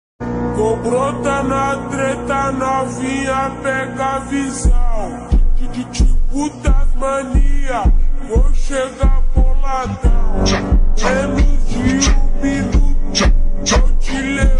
Phonk Slowed

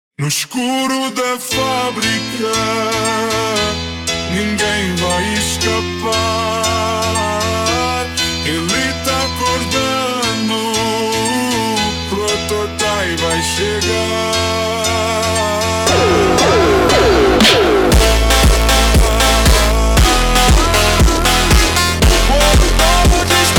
Montagem slowed

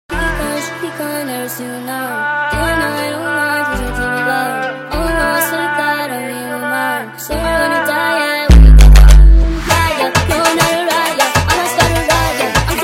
FUNK

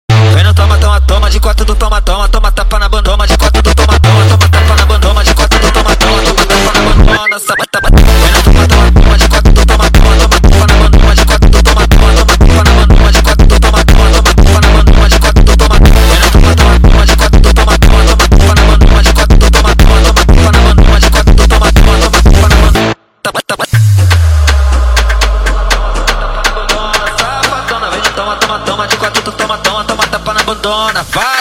Sped Up

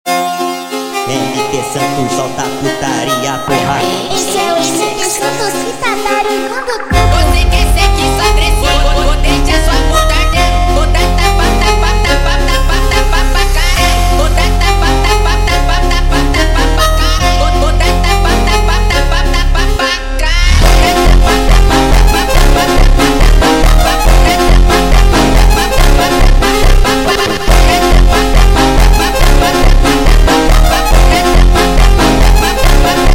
Montagem sped Up

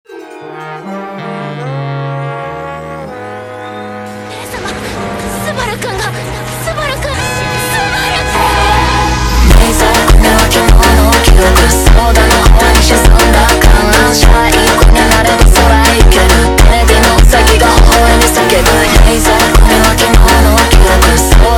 Funk slowed

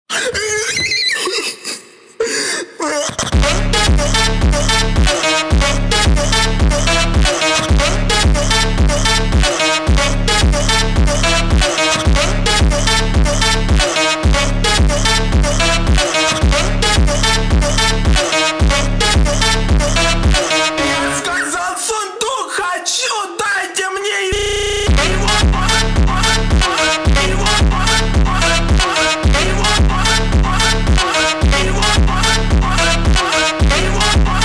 Funk

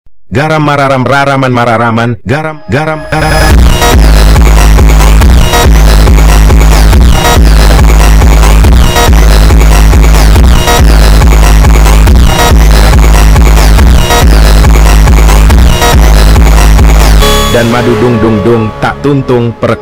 FUNK bassboost

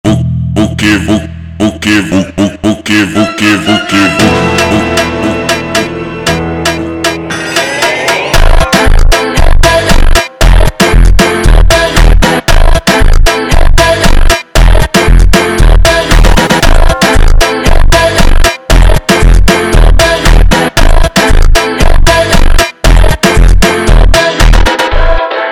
Funk slowed

